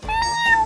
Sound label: mew; quiplash